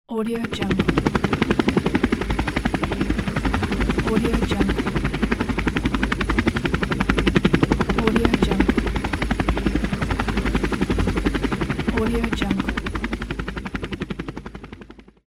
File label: helicopter